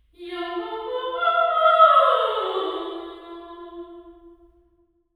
source 1 receiver 4 sing, sound sculpture Iceland model; tvisongur